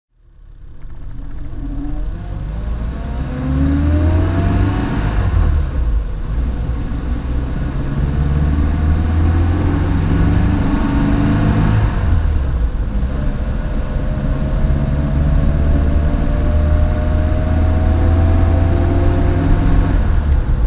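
riley rm saloon driving, Riley RMA 1.5 Litre saloon, engine sound; 1952; V3D/ ClassicarImages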